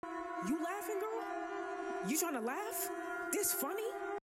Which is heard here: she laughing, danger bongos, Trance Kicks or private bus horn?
she laughing